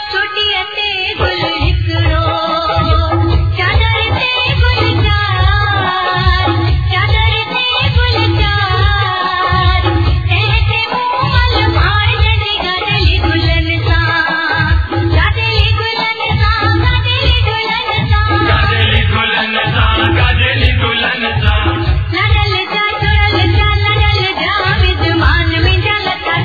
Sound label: sindhi folk